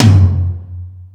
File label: BIG TOM, MOD. DRUMS; East West; Global Sounds